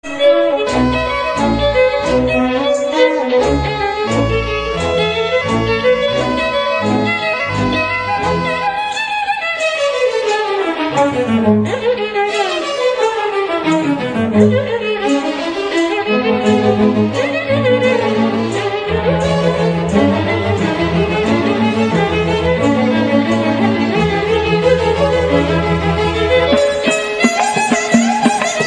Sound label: Violin Fusion